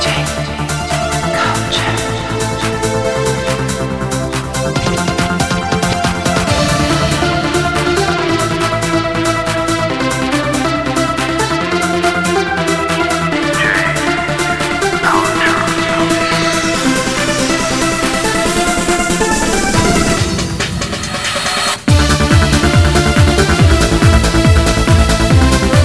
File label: music; cs discothek